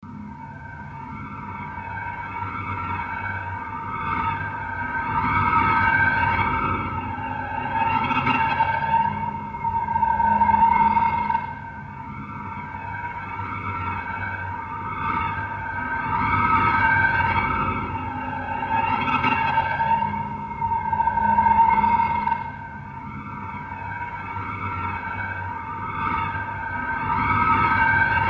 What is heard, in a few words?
ambient subway